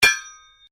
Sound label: Strike Metal On Metal Heavy Ring; Foley sounds for melee weapons; Materials